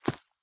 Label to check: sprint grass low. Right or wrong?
Right